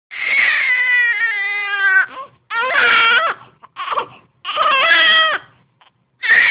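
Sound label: baby hungry, female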